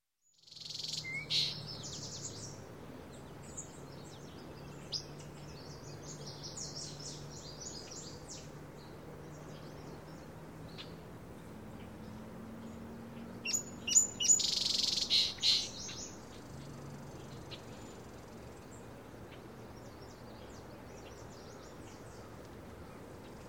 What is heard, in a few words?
Birds, Various Country Ambience, Early Morning; Sound Ideas 2000 Series